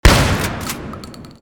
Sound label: Shotgun